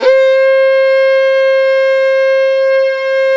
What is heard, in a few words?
violin C3, Balkanish Traditions